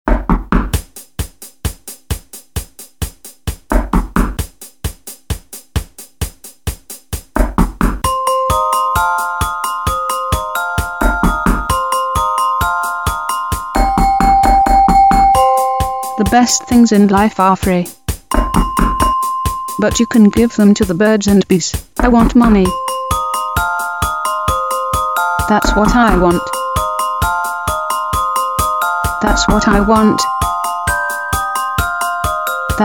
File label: door chime